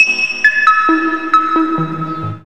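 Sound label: synth sequence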